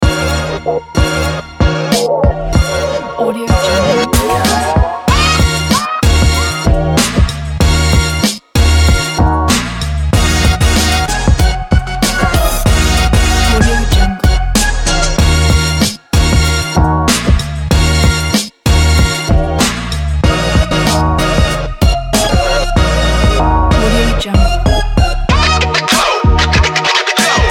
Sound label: energetic upbeat hiphop